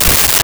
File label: skratch; Sound Effects Library (28Cds) plus bonuses